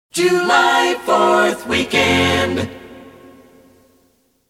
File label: Jingle; Pro Pak; July 4Th Weekend